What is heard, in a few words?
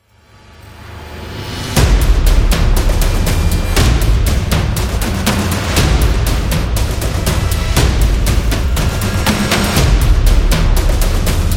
Battle Drums